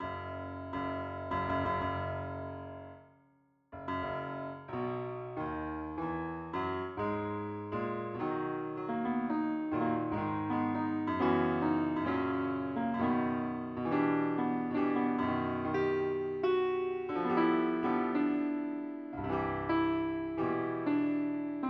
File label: piano